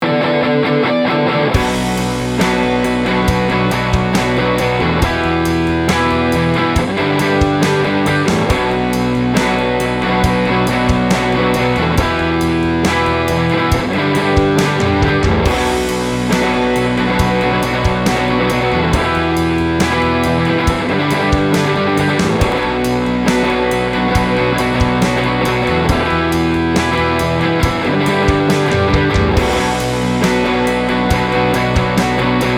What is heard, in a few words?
Backing Track